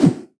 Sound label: Fwoosh; Beat Saber Miss Sounds